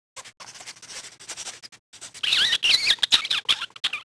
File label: Rat Idle; characters